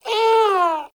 Kitten Meow Agitated; Enemies; Warframe; Catbrow

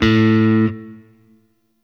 Shawzin; Warframe; Sentient Shawzin

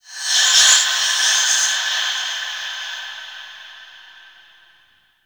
Breaths, Ambience; Warframe; The New War; Zariman

